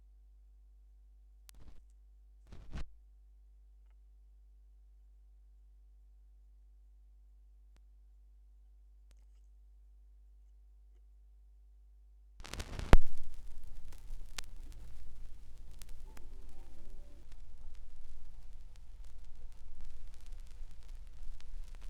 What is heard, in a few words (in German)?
schallplattengeraeusche; rauschpartikel